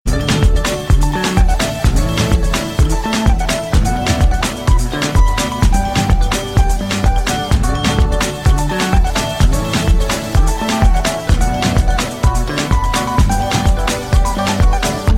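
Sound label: instrumental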